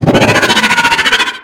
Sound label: Glyphid Exploder Scream Scream